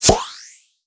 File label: Pullout Hypnobeam